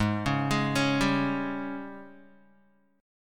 Ab Major 7th Suspended 4th Ab down Guitar 4E 4A 5D 5G 5C 6F; arpeggiated; JGuitar